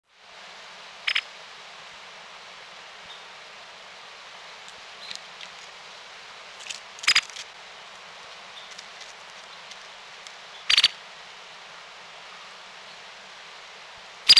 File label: white headed woodpecker